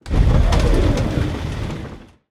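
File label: turn open door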